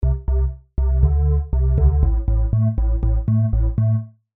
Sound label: Synthesizer parameters low pass filter low resonance; Filter settings